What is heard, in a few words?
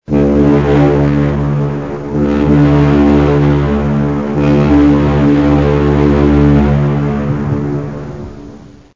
foghorn; Android; SoundTest